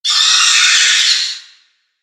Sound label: screech